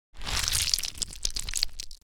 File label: Cartoon accent slime squelching and gurgling